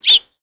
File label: Amb Animals; Red Orchestra Ostfront 41-45